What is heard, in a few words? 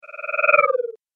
Magic Power Down; Magic Sound Effects